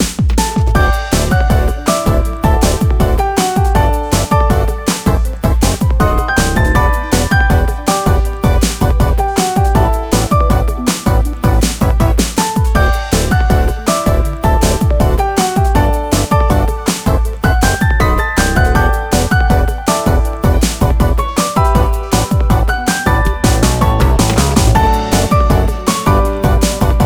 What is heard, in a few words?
Bgm Everyday girl